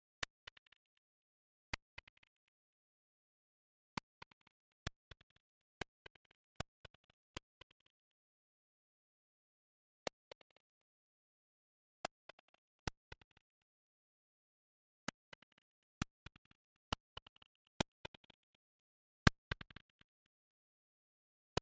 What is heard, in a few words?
rain